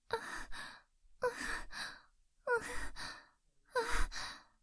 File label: cute anime moan